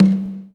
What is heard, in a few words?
CONGA, CONGAS; Best Service; Dance Mega Drums CD2